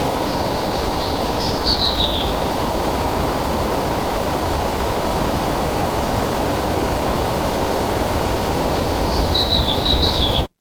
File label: ambience forest